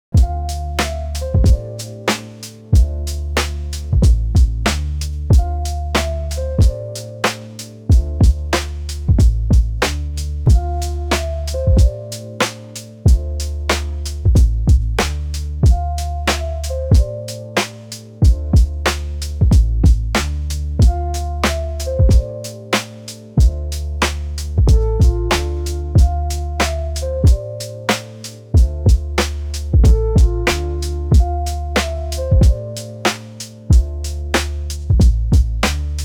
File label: Instrumental